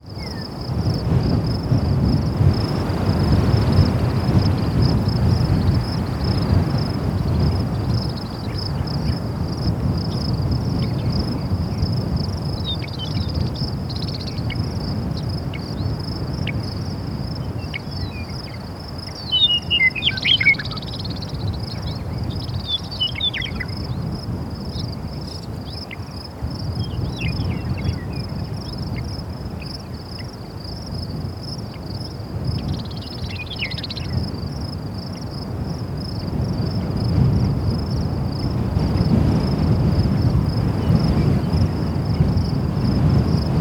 nature wind strong gusts night crickets new mexico usa; felix blume